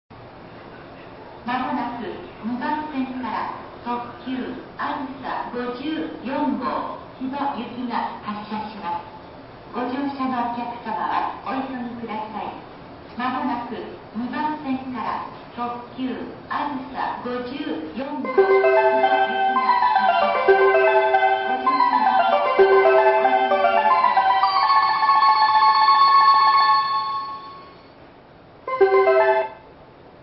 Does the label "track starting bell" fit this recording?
Yes